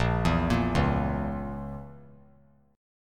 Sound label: A sharp Diminished, chord; arpeggiated